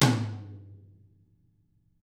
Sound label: DW HIT, Drums; DW KIT1